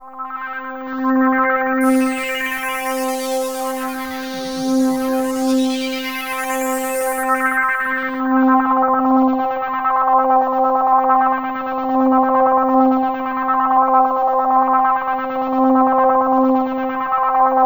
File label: Access Virus B; Synthworx